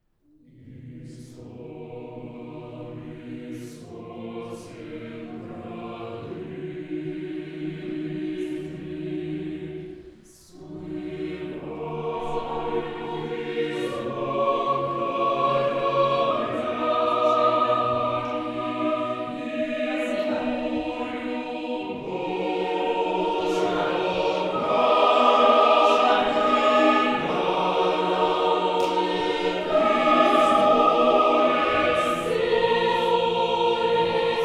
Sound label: Brauner phantom XY normal, choir